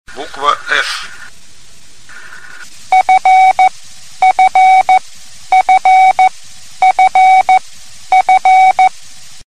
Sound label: CW